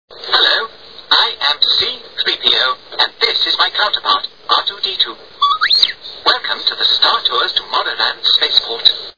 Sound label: people